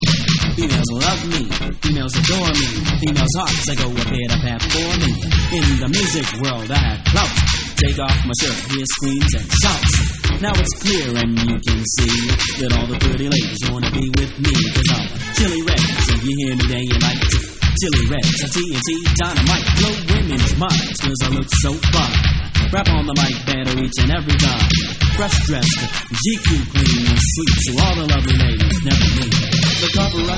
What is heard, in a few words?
Vocal